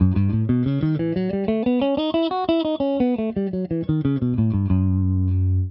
Fa Diese Dorien b9, Guitare; Exemple audio " Fa# Dorien b9 "; Mineur Mélodique

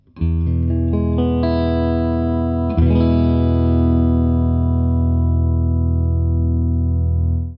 Mi majeur, Guitare, Accords ouverts

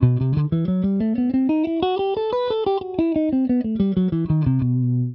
Si Phrygien augmente, Guitare; si mixo b9/b13; exemple audio "si mixo b9/b13"; Mineur harmonique

